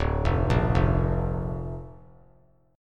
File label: D Minor Sharp 5th D down; Dm#5 arpeggiated; JGuitar